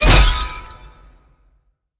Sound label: pwr charge; smite; tiamat